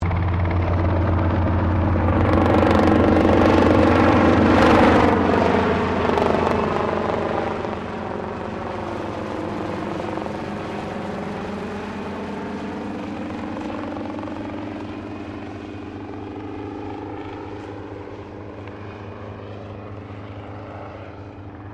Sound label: HELICOPTER ROBINSON R22 PASS OVERHEAD LOW; Helicopter Sound Effects